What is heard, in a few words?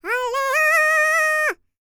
MACEDONIAN, World Voices; ILIO; Vocal Planet VOL-5